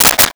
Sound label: Switch; 1001 Sound Effects